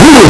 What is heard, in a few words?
pain